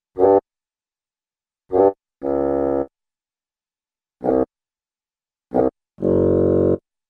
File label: Bassoon Low-pitched Short Melody; Hollywood Edge Cartoon Trax